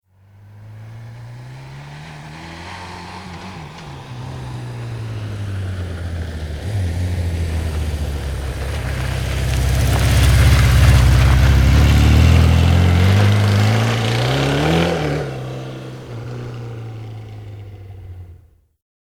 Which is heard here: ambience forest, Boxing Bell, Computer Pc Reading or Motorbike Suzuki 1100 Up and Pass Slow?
Motorbike Suzuki 1100 Up and Pass Slow